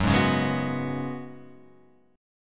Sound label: F sharp Suspended 2nd Suspended 4th